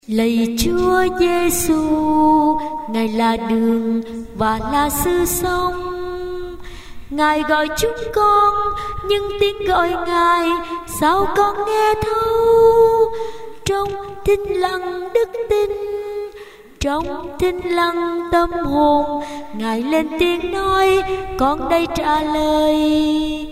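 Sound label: soprano alto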